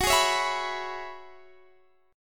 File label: Gb 7th Suspended 2nd Suspended 4th, Guitar, DADGAD